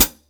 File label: HH PD, Drum 1 Dry; East Collexion